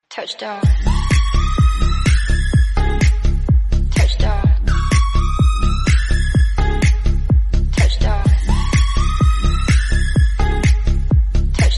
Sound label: whistle song korean